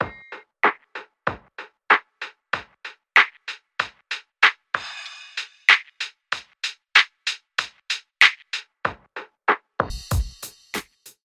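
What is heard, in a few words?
Phone Filter; KP3+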